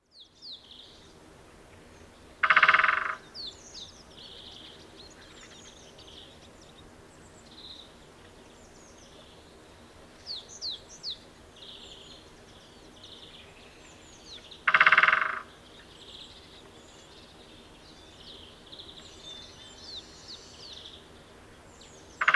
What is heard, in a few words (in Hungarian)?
nagy fakopancs hangja